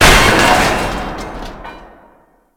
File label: Destructable Vent Cover; Warframe